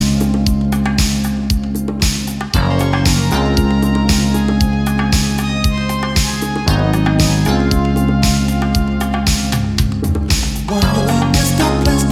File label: groove version